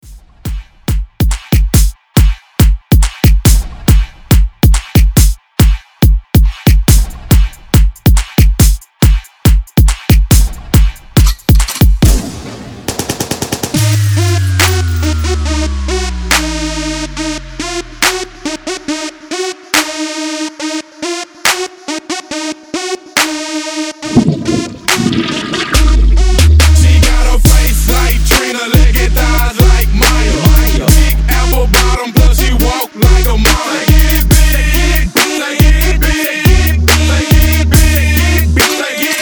jersey remix

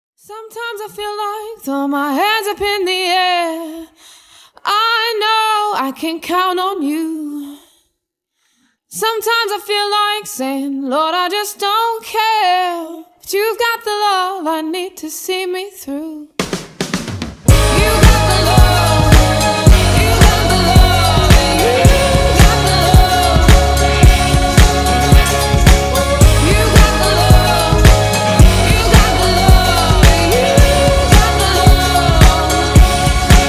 acapella intro; clean; short

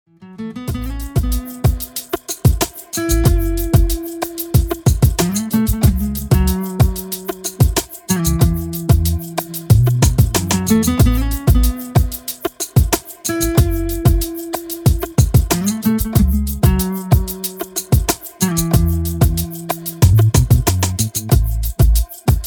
summer rain instrumental

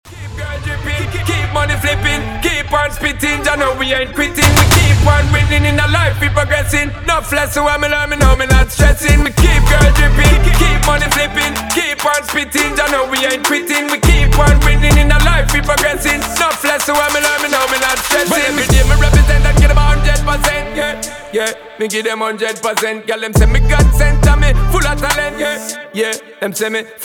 acapella break

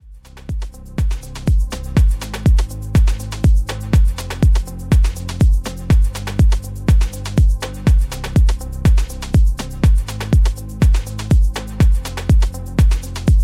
afro house remix

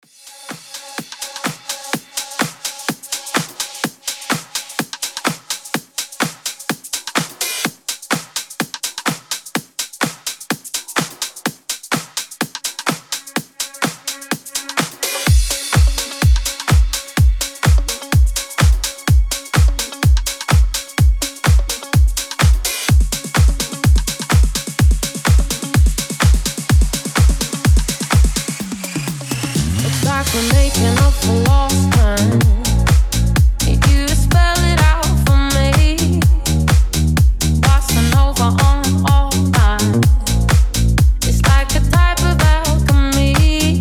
disco remix 126; clean

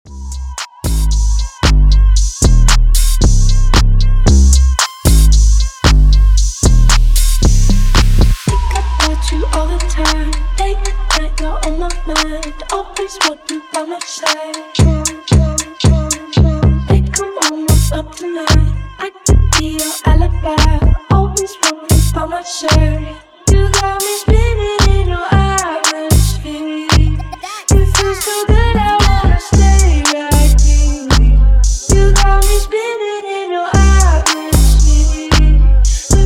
live hip hop blend clean

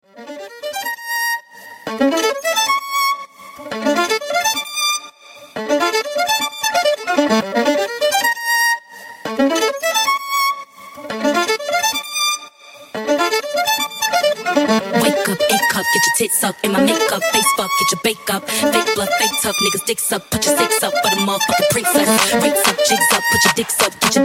violin

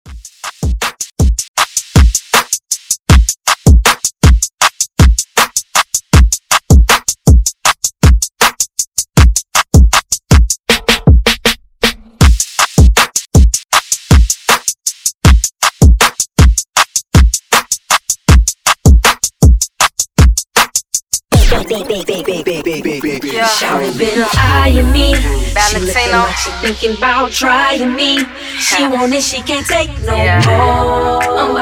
throwback intro

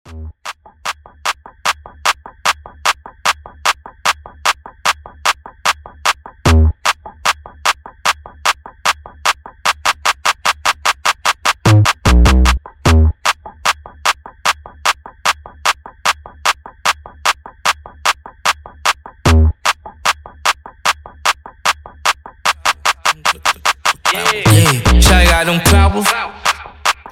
clappers clap hype intro

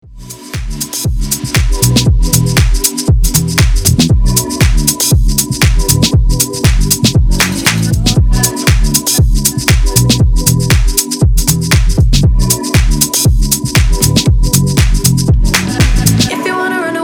poolside mix; clean

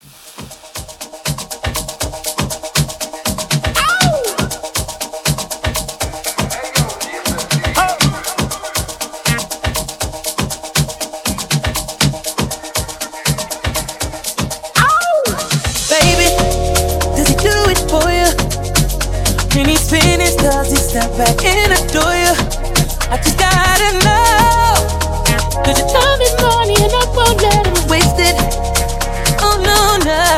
intro amapiano re work

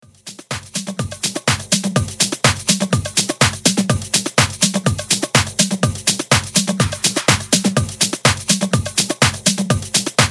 poolside house mix; clean

